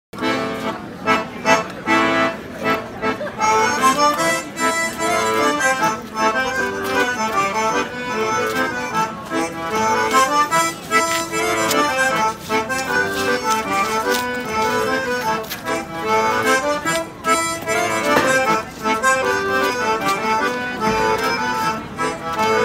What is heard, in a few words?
Ny Pet Schottis